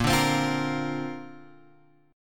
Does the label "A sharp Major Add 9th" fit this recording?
Yes